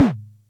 Music Percussion Computer Tom, Drum Machines

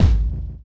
Kick, drums; Sonic Foundry (Sony Creative Software); Drums & Drones; Construction Kits; Skip Kit

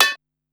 Pot Hit, Korg Wavestation SR; Single Hits

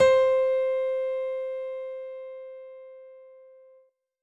Digi Piano C5; Big Fish Audio; Old Skool House; OSH Piano

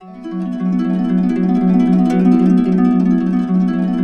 F#, Harps; Complete Harps; USB Soundscan vol.62; AKAI

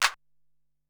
Claps, Drum Machines Ensoniq SQ-R-Plus; 300 Drum Machines

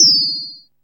ANALOG BELL; ANALOG BELLS; Ambient; Zero-G; Ambient 1

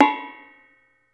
BONANG; Metalophones; E-MU Producer Series Vol. 7 – Old World Instruments

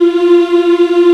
VOX African Mm; Roland LCDP11 Africa VOL-1

